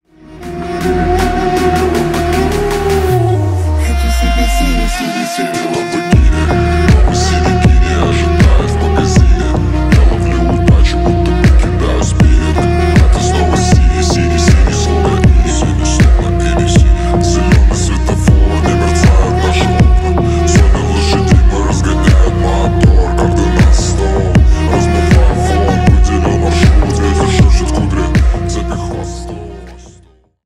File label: arab remix